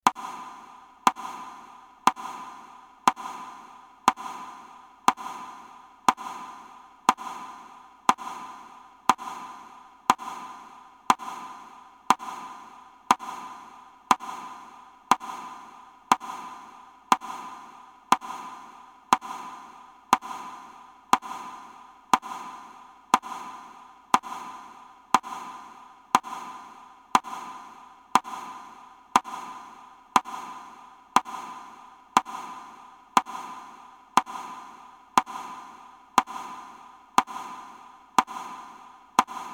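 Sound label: Metronome metronome sound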